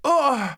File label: damage; Psyper; Mob